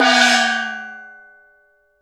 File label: DARUO HLF, PERCS-KIT; INIS - Opium